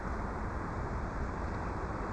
window; Mafie 1

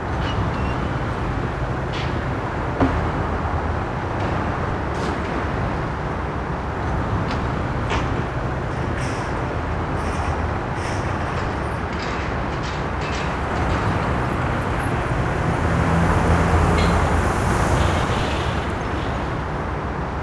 ambi town; Mafie 1

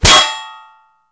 metal; Mafie 1

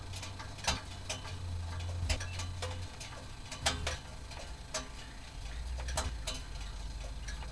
motor; Mafie 1

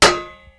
metal; Mafie 1

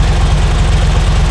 ford V8; Mafie 1

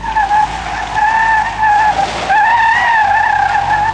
slip; Mafie 1

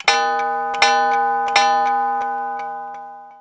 clock; Mafie 1

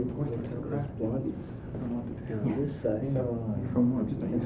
people; Mafie 1

